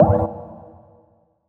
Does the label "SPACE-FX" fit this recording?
Yes